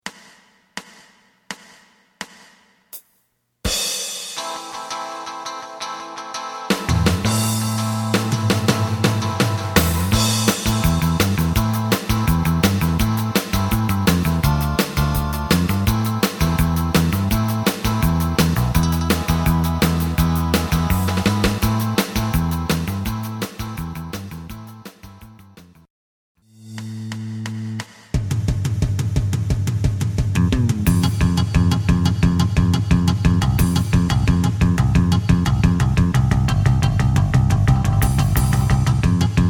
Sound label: lead